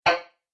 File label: Message Error, beep